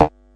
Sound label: silly elec fx; UNUSUAL KITS and FX; Silly Electro FX Kit